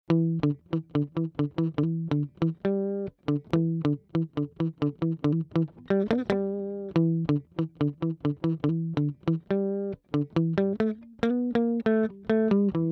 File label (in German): E Git Neutral; magnetismus 2